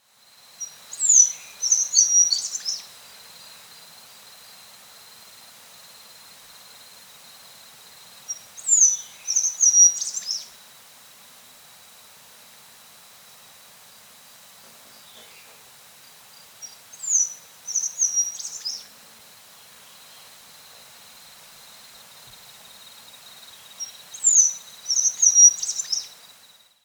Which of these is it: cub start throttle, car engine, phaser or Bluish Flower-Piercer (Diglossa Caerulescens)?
Bluish Flower-Piercer (Diglossa Caerulescens)